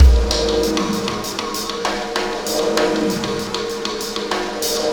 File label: Antimatter Perc, Processed Drumkits; Sonic Foundry; Sony Creative Software; Zero Gravity Beats